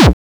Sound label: Sh-101 Dirt, Kick Drums; Zero-G; Soundclash